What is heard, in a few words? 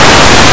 box; Blue Heat